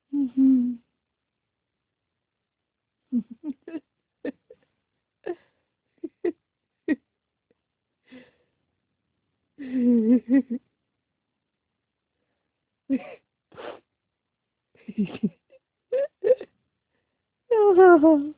My laughter

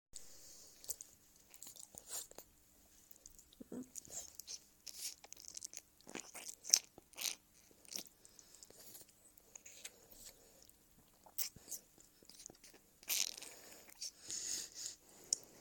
Wet sucking noises

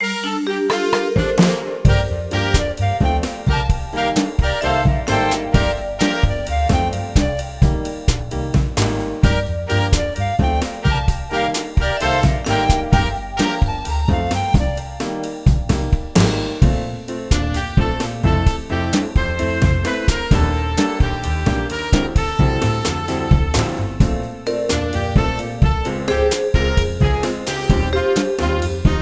sax

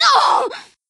Short Scream; Lewd SFX

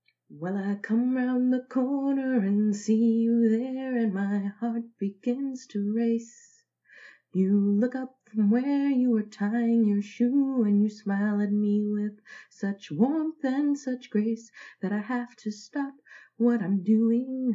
cover, acapella, not the best mic

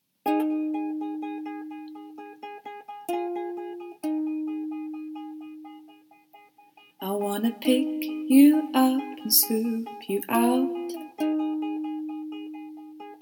Singing, Played by a not tuned properly Ukulele; Cover

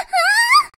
Short Scream; Lewd SFX

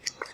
Sex Slide

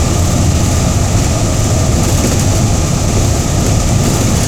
Large Generator; Generators

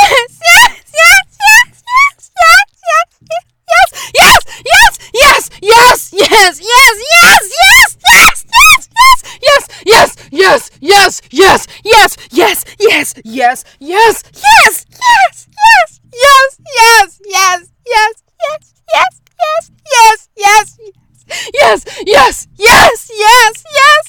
Screaming Yes For 1 Minute

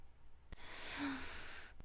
Moan

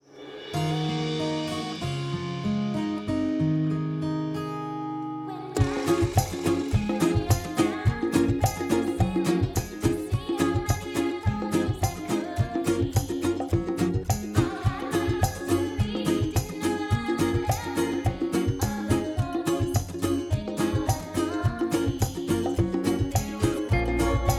(instrumental); My Little Pony OST Repository; Season 2; Bonus Music

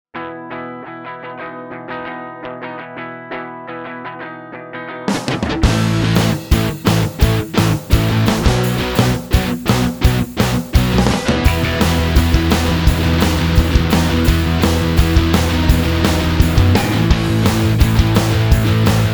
Forest Rain Instrumental; Trixie

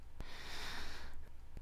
Breath; Lewd SFX

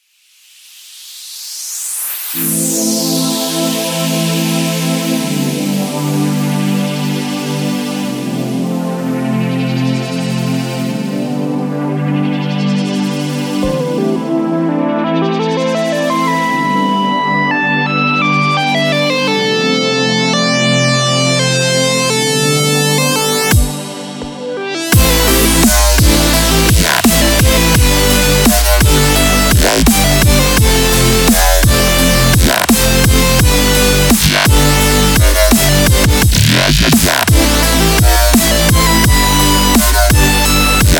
Happy Bug Noises

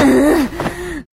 Grunt; Lewd SFX

